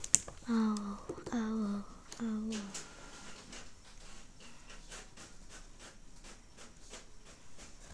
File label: owl